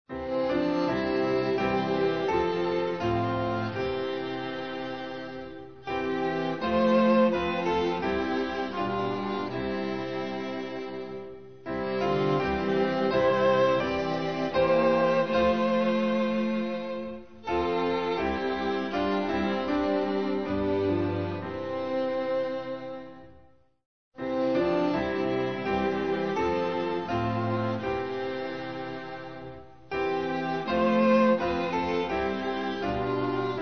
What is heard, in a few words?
we love thy house o god instrumental; hymns french